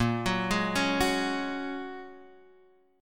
A sharp Minor 7th Sharp 5th A sharp, Guitar Half Step Down